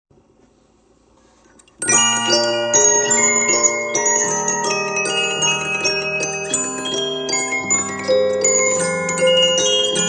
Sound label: waltz